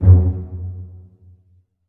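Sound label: string pizzicato F#1 mezzo forte right; Sound On Sound - Peter Siedleczek's Orchestra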